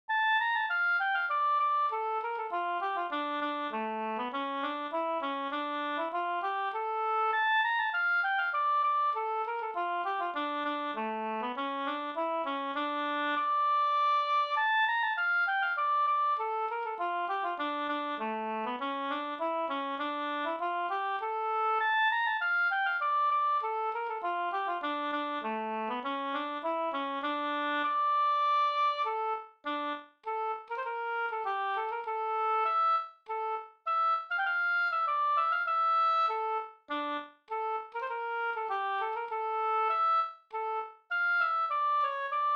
slangpolska

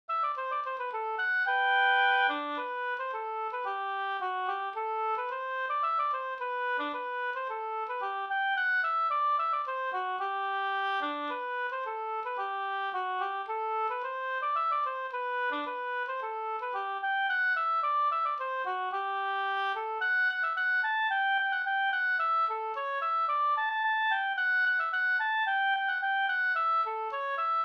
kadrilj; fr Oxie harad SvL

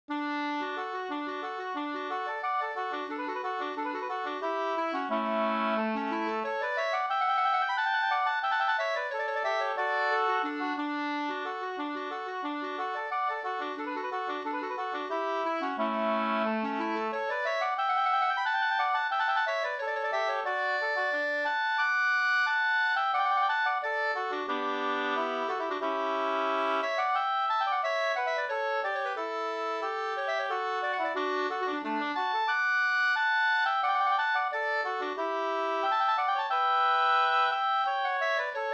ganglat; Efterkälken, gånglåt efter Hjort Anders Olsson; med andrastämma av Lennart Bergsström

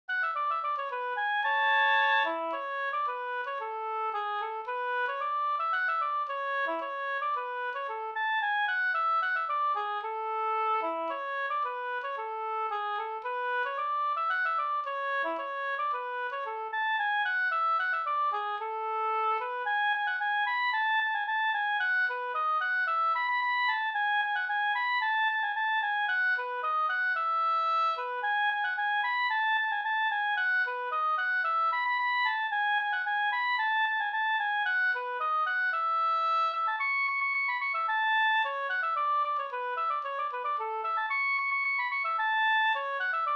kadrilj A dur; fr Oxie harad SvL

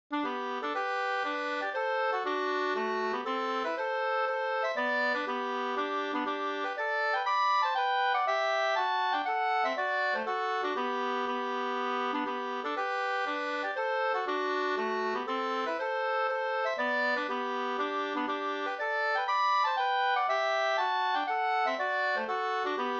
polska Bodapolska polska; Bodapolska efter Soling Anders, Boda, Dalarna

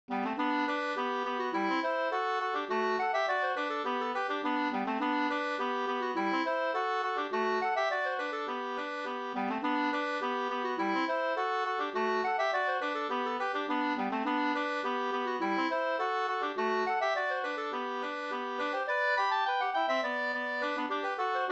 ganglat, 2st

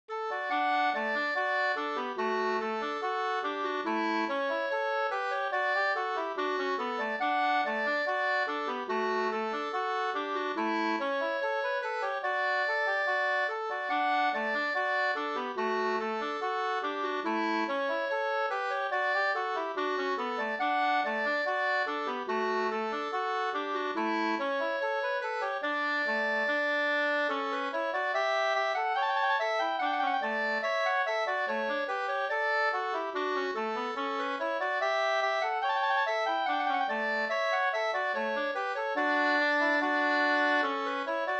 skanklat

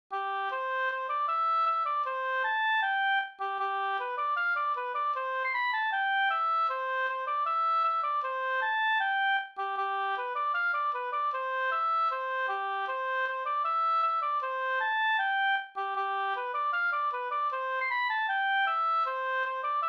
schottis; Vasterljung; Curt Tallroth

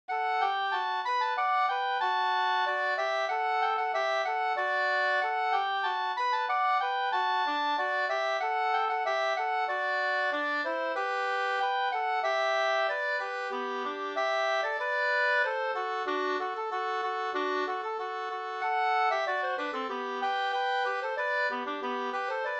ganglat Marsch, 2st

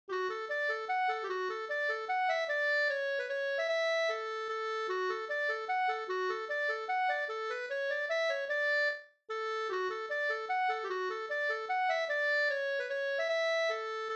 vals Ddur

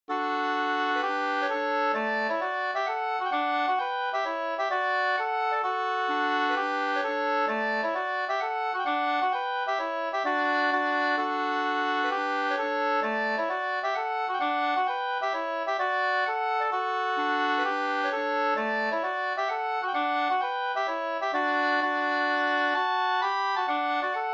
hambopolska

